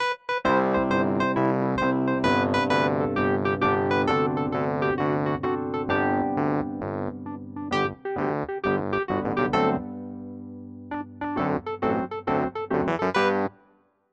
if youre happy and you know it clap your hands wurlitzer